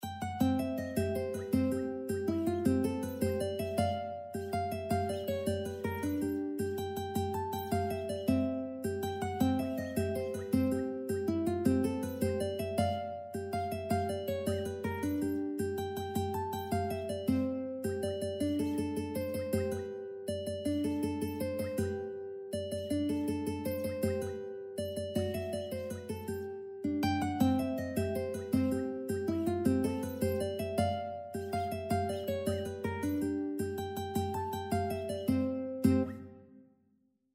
mexican hat dance, ukulele

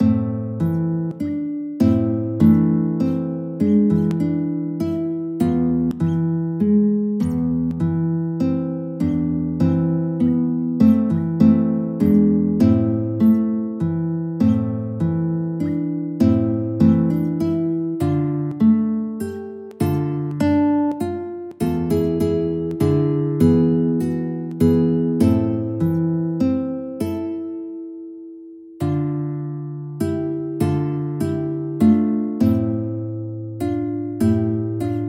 Waltz, guitar

